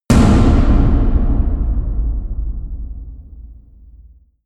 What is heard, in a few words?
huge tom hits; music elements; percussion